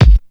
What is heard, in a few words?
Kick, Drums Percussion; Zero-G; Total Drum Bass